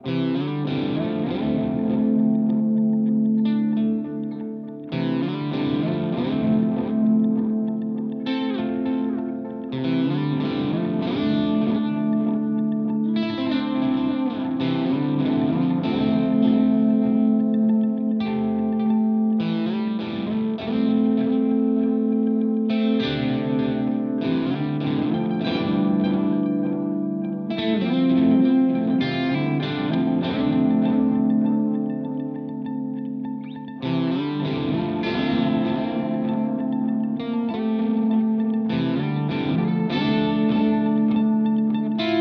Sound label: MXR Carbon Copy